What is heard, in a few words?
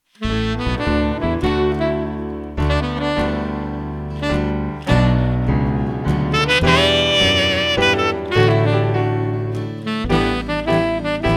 vocal SATB